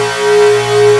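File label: DISCO BELL A4; Analog Odyssey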